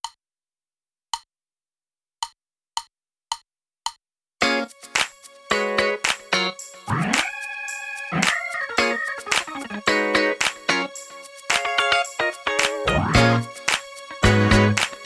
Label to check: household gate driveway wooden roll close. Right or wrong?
Wrong